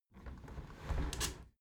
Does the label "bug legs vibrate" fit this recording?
No